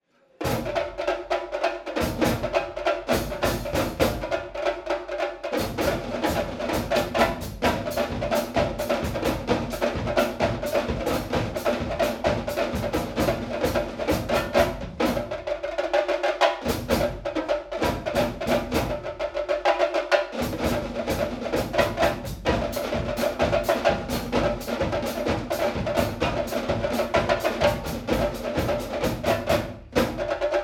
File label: Bongo exotic